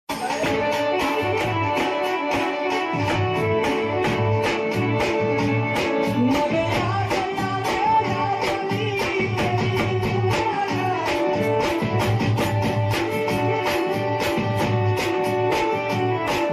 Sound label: Gujrati Song Ringtone